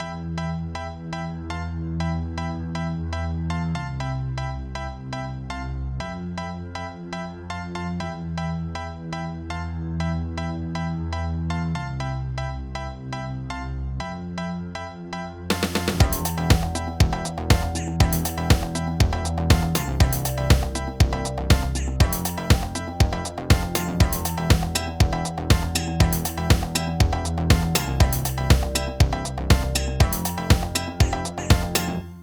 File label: House of Amnesia 120 bpm, Old Skool House; Big Fish Audio; OSH Construction Kits